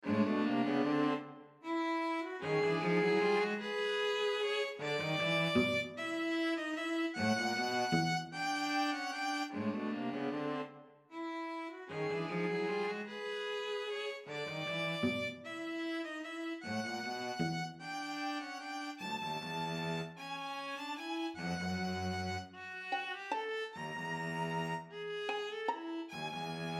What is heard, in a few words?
string trio